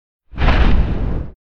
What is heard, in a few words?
Swipe